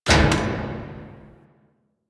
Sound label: Puzzle Switch Step; Missions; Raid